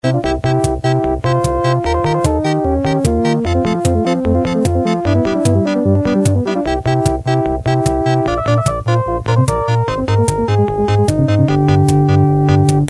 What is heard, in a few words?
adlib, pc; Silpheed